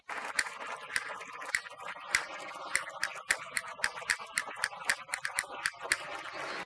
Castanets Violin Applause

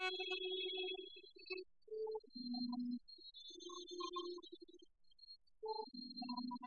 Castanets Violin Applause

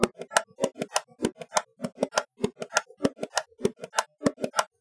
Bongo

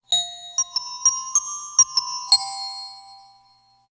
Glockenspiel

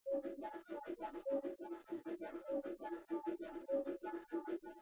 Bongo

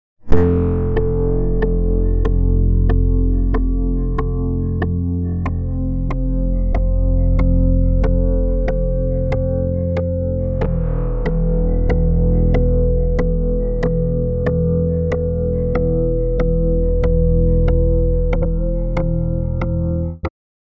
Synth Poly

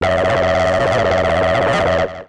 Synth Mono

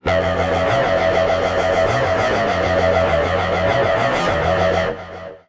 Synth Mono; TSMtoolbox

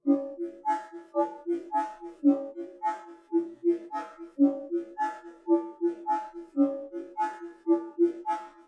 Bongo

